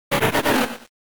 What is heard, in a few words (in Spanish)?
Grito de Treecko